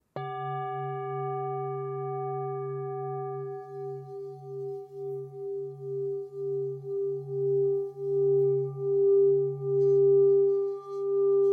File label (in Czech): tibetska misa